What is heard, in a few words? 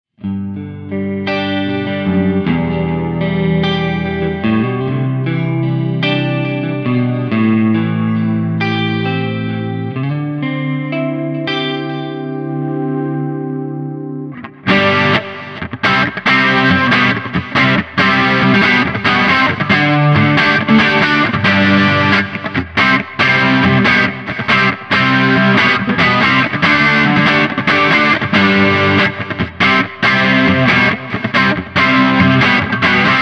harley benton dnafx git